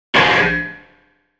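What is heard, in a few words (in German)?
glass perlen spiel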